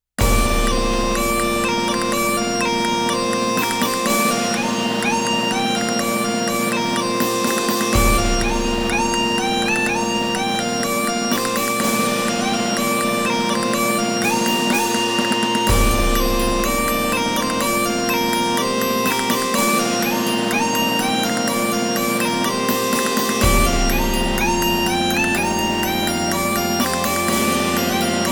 バグパイプバンド

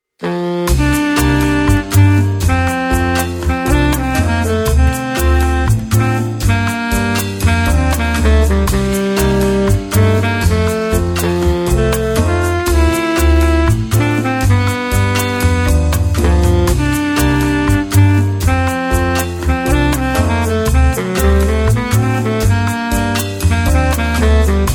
ラウンジミュージック

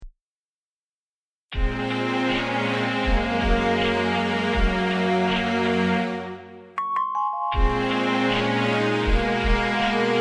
地下室

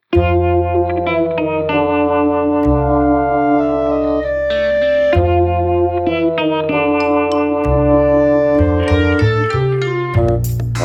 ドラえもんっぽい曲

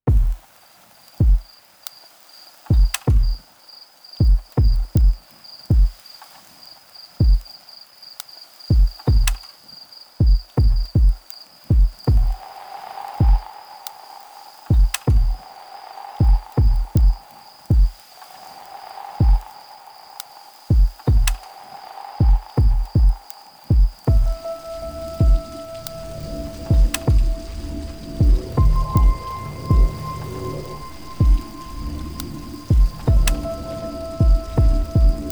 環境音楽

